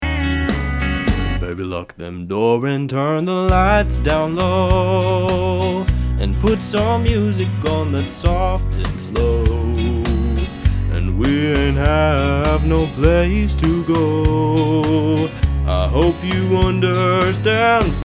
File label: Vocal Country; Vocal Reel